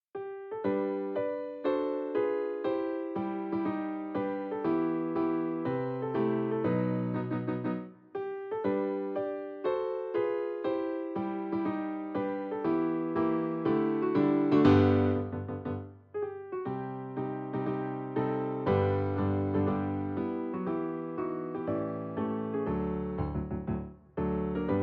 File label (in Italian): Moderato per pianoforte; Raff Joachim